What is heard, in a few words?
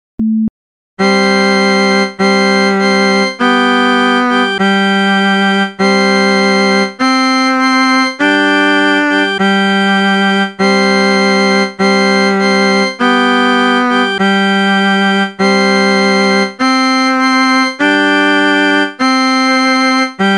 Freude schoener Goetterfunken Accompaniment; Beethoven